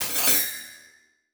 Transition Feedback Ring

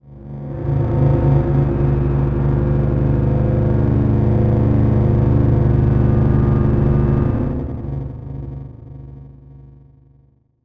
Transition Distortion Power Source; Adobe; Production Element

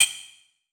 Click Mechanical

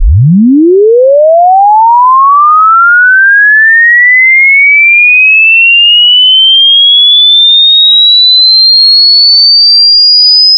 sine sweep linear

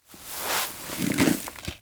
Industry Dirt Scraped Into Bucket

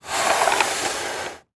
Weather Snow Shoveling Snow Scrape Cement Soft

